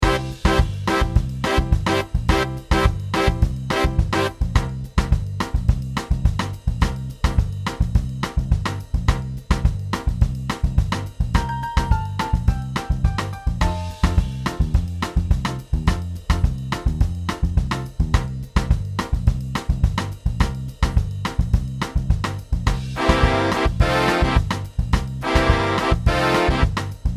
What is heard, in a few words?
big band vocal track